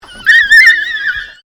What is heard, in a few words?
Animal African Wild Dog yelping, Wild Dogs in a zoo habitat; Miscellaneous Animal; Animals